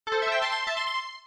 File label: level complete splash